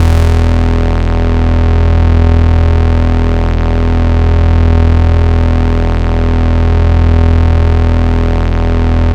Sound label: Bass; MPC